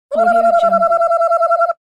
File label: cartoon indian war whoops